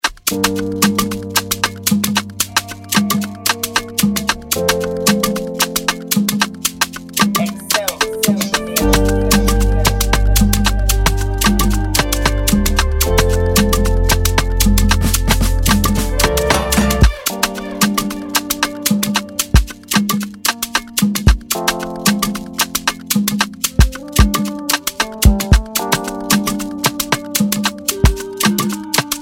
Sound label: Afropiano Type Beat; amapiano; afrobeat instrumental